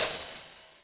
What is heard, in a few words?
CYMBAL, Yamaha RM 50